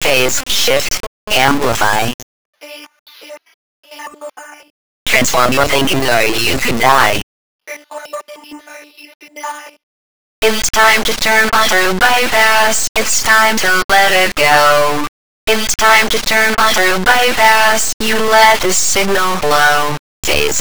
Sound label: 190bpm